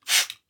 Spray

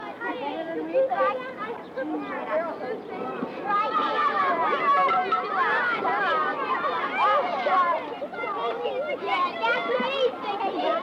Group Of Children Play

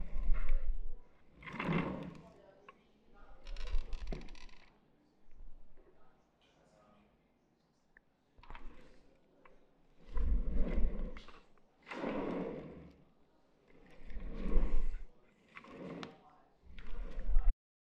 Moving Chair

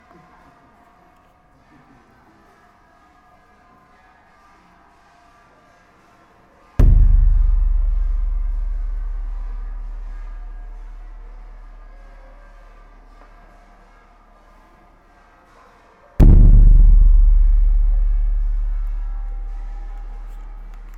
War Drum Single Hits

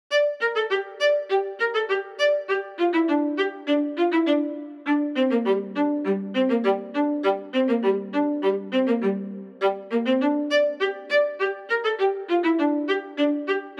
Orchestral Score Violin Solo

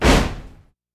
Foot Stomp

